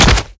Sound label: step close, zombie blind